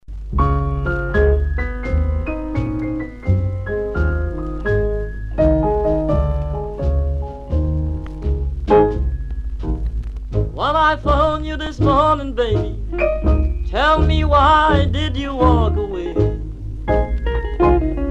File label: telephone blues